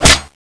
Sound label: empty, stinger; cso